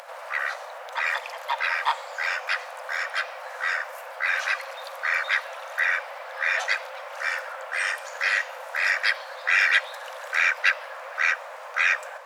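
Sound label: Anas domestica adult; Domestic duck; Quacking of an adult female duck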